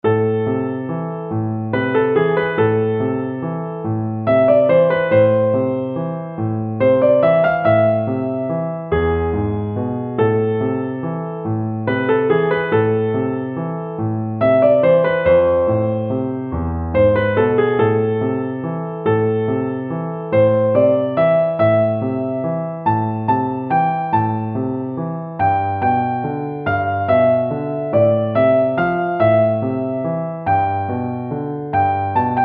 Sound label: piano